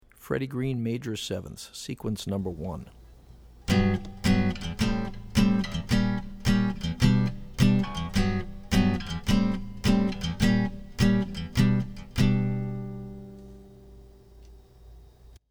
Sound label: Maj7ths Seq; jazz chording; Freddie Green